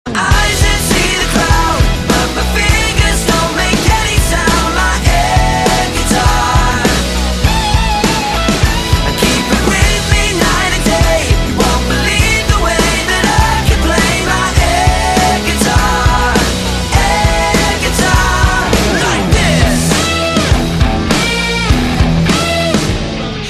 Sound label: air guitar